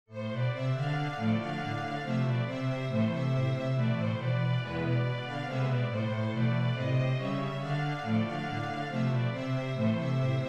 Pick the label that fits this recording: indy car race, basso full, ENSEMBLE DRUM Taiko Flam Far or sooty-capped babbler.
basso full